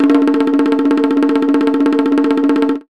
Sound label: Gu Roll; Roland BK-5; Percussion